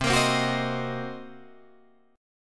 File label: C Minor 7th Flat 5th Guitar Drop D, chord